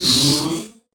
Crystal Comm Boost; Experimental; Ambience Deep Sea Mining; Crystal Communicator

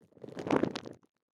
Rockfall, Rock; Hits; Materials; openclonk

